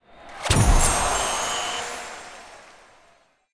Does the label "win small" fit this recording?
Yes